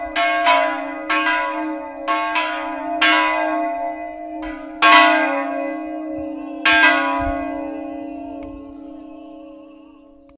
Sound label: church bells sound